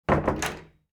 door close

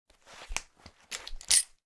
belt, cloth

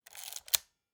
camera winding lever; mechanical

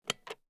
switch

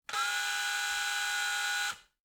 electric drill

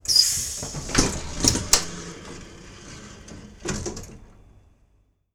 bus door open; transportation

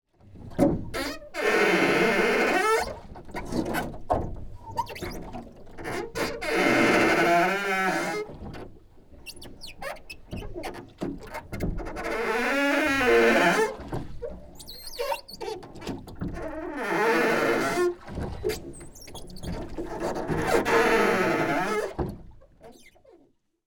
rowing boat oars creaking; transportation

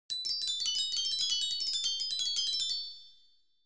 magic chime

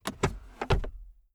glove compartment open; transportation

